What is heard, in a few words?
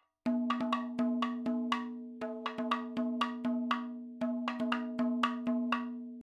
120 Mad 4 4